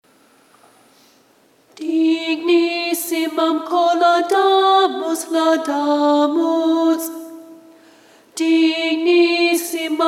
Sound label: Aufer a nobis Alto